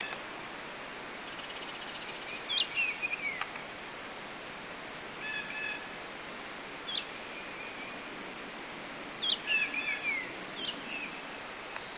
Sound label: bird